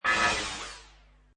Space Cut, Scifi drill, hydraulic door, robot, panel movement; Space cut 4: : Scifi drill, hydraulic door, robot, panel movement, or swipe.